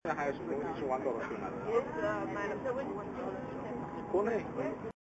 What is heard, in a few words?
random train noise